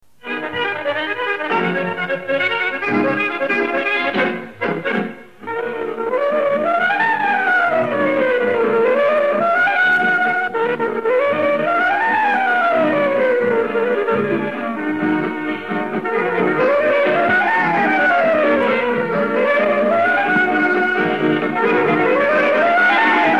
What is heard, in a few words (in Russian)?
Lirichesky vals (instrumental); Muzykalny aviatsionny institut